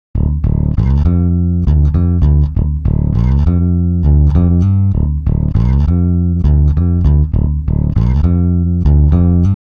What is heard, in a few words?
bass; ikm sample tank ps ts